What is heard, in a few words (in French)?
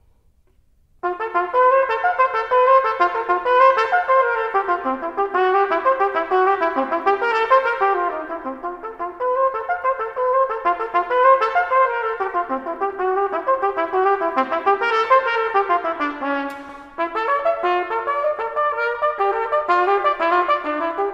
De l'articulation, trumpet; Charlier Etudes; H.Hardenberger